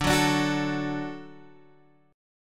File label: D-Major, D, Guitar, Standard; D strummed; JGuitar